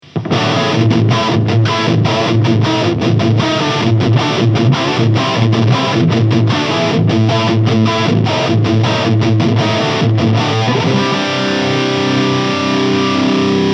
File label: Orange TH100 High Gain; Shape 12 Uhr